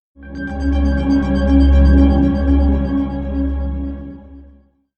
Scifi Audio Logo Ident, Solid Base Twinkling Alternative; Scifi Audio Logo Ident 42,Solid Base,Twinkling,Alternative